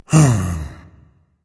sigh, berserker; cuchulainn

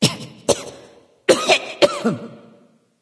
clear throat; smite; voicepack; nike

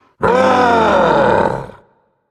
battle cry; Smite; cerberus

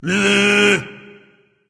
tongue berserker; cuchulainn

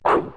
bark; Smite; Cerberus

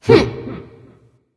hehheh; smite; jingwei

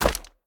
footstep; smite; god tiamat

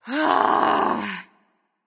sigh; smite; nuwa

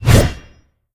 swing; Smite; god Lancelot

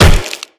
impact crunch; Smite; Cabrakan

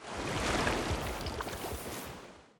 victory waterstep; smite; poseidon

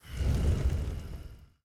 grunt; fenrir

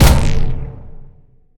stun; smite; cerberus

